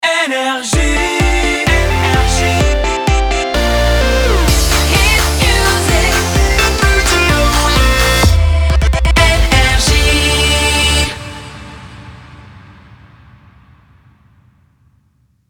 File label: jingle; NRJ